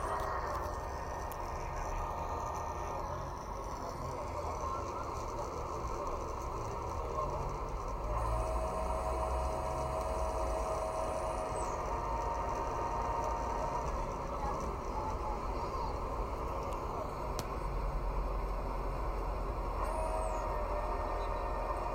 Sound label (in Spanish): Tren aterrizaje